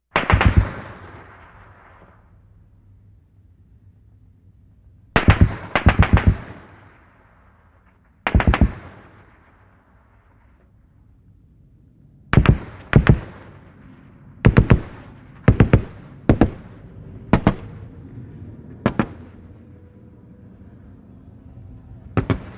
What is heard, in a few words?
.50 Caliber: Firing, Various With Turret Movement